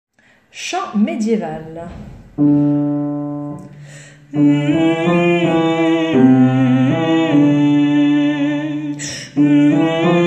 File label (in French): Chant médiéval